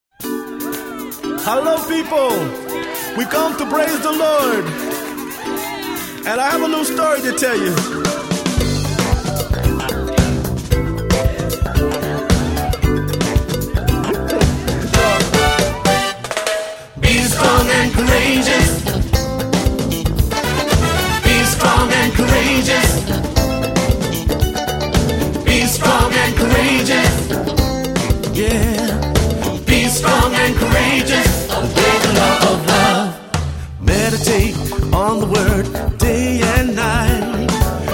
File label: be strong and courageous joyful gospel